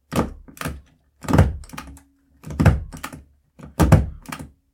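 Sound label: sound of stapling paper four times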